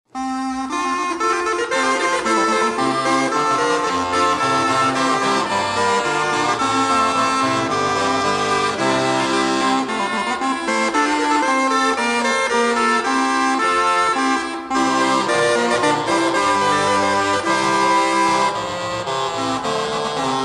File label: 6 crumhorns; Ihr Musici frisch auf; Hassler